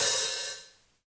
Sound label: Long Open Hi Hat One Shot F# Key, Royality free open hi hat one shot tuned to the F# note